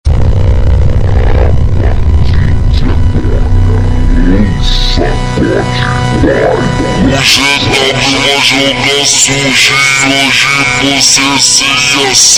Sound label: Montagem